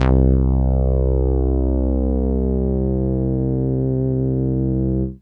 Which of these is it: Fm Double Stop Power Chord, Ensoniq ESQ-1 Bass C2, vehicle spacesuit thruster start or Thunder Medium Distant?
Ensoniq ESQ-1 Bass C2